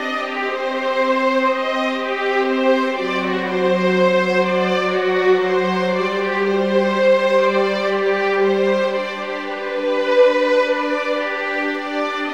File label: strings, Sad